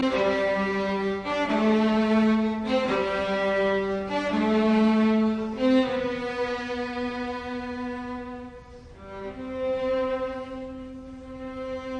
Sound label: String Quartet in C major Chacony Sostenuto; Britten; String Quartet No 2 in C major Op 36